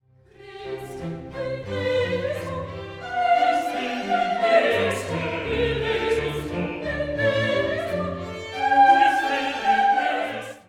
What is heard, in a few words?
Christe